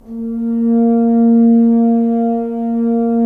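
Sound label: Solo French Horn, Brass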